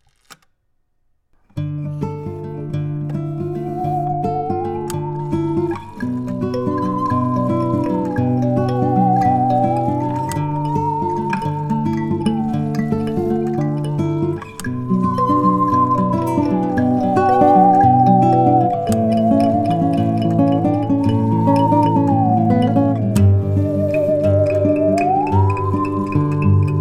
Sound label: spooky baby lullaby